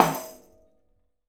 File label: Tambourine Skin Hit; Essential Percussion